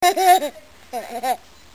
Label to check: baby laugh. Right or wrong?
Right